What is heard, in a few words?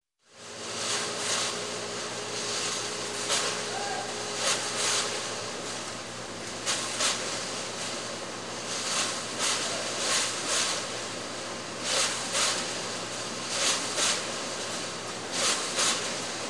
Bottling Plant Cans On Conveyor Belt, Factory; Industry; Sound Ideas 2000 Series